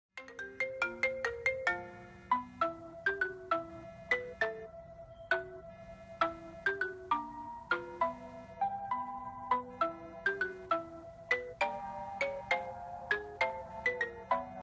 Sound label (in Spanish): tono mensaje; harry potter